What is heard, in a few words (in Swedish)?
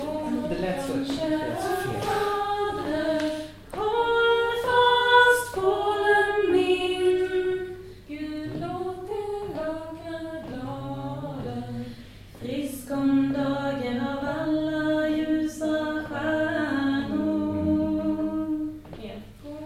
2a stämma omkväde; Staffansvisa Sörmland